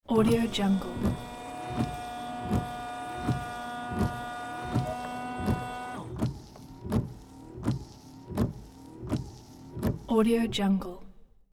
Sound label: chrysler lebaron windshield wipers running